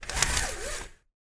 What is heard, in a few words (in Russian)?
zvuk zhaliuzi